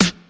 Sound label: perfect snare one shot f sharp key